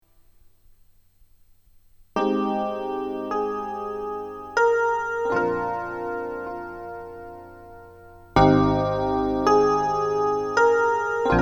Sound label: EPiano2